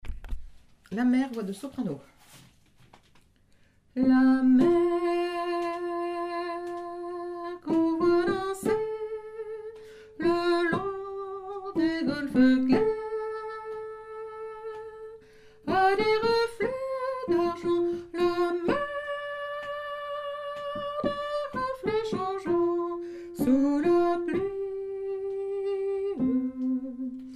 soprano

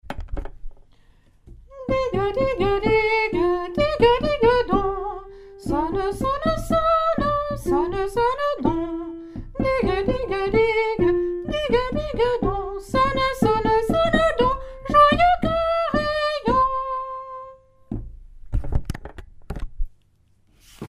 soprano, chorale

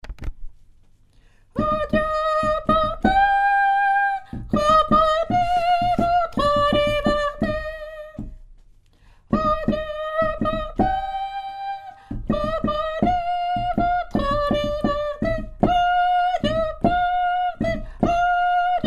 tenor mesure, chorale; saltimbanques

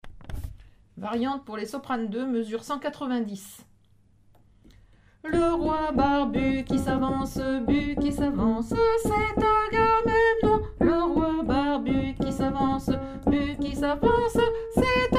soprano, chorale

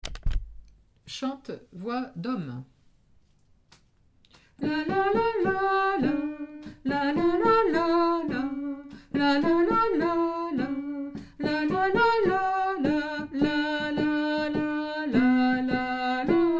chante hommes

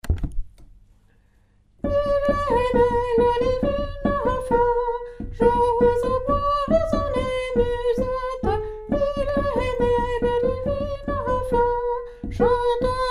il est né soprano tenor; nouveaux noels; chants noel

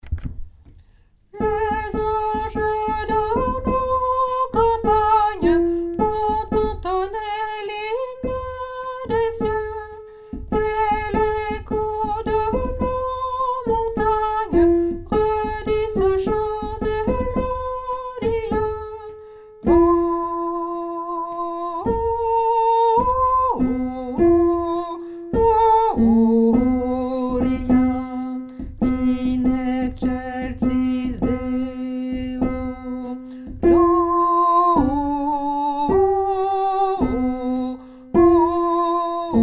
les anges basse; nouveaux noels; chants noel

